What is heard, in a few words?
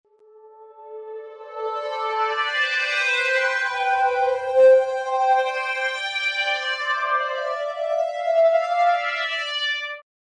trem reversed